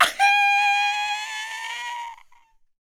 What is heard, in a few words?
YELP, World Voices, ANDES FX; Spectrasonics Vocal Planet